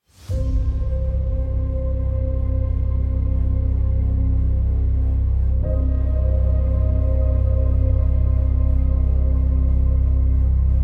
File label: Piano Trio in E-Flat Major, Andante Con Moto